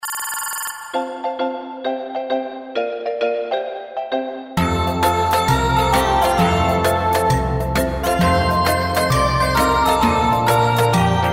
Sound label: Raindrops